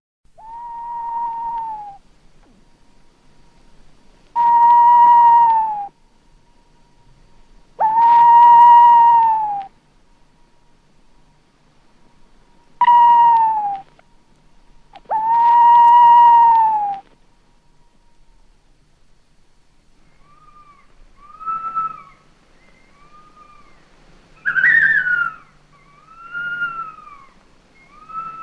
Zwa Koykoyvagia

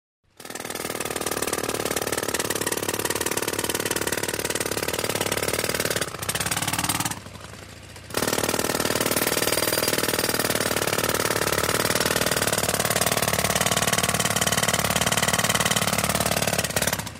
Ergates Me Kobreser; Epaggelmata

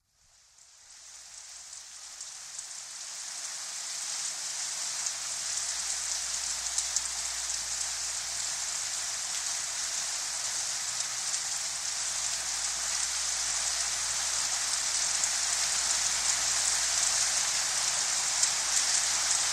Perivallon Psilh Vroxh

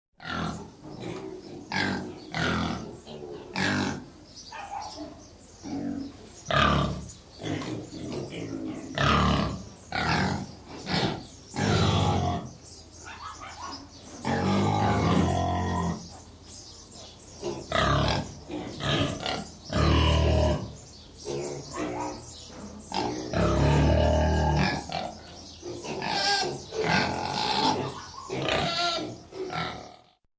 Zwa Goyroynia

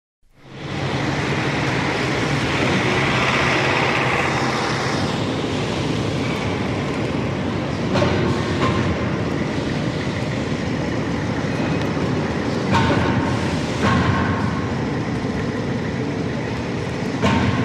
Hxoi Mhxanes

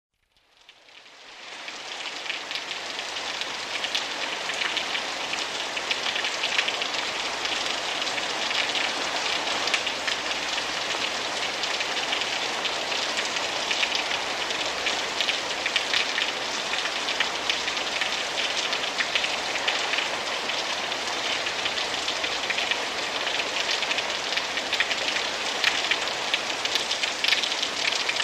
Perivallon Dynath Vroxh

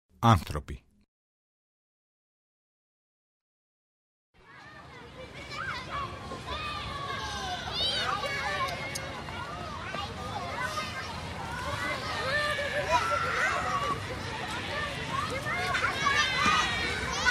Anthropoi Paidia Poy Pezoyn

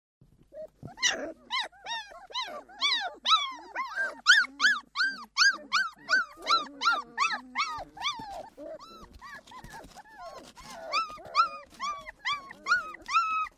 Zwa Skylakia

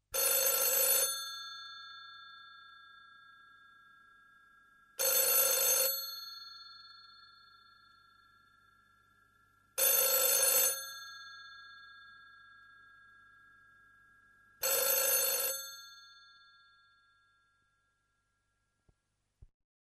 Thlefwno

